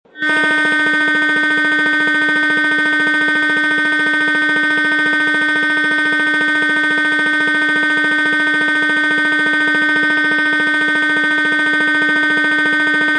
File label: shiida bell